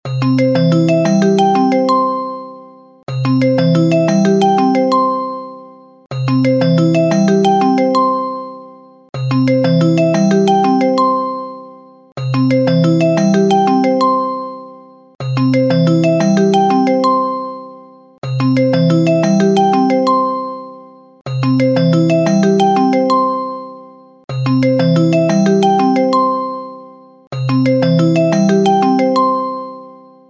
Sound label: longcall